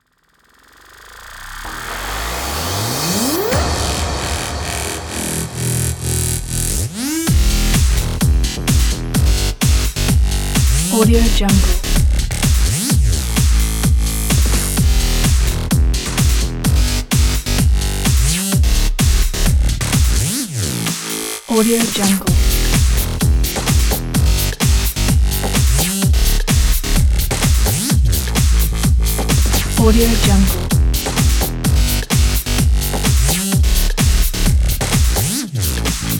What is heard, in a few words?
background electro house